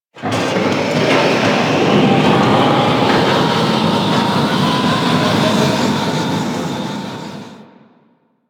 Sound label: Void Dragon Orb Charge Riser; Enemies; Abilities; Warframe; Duviri